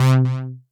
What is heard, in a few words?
Synth C, Synth Prophet One Shots; Electronisounds